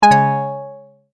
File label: multimedia tone error; Tones; Sound Design; McKinney Sound